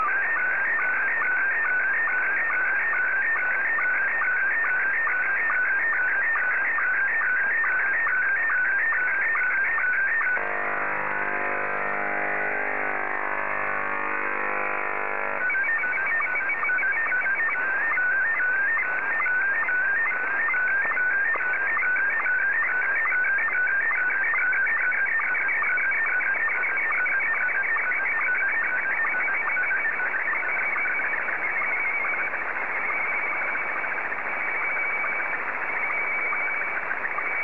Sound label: sstv; VK8VTX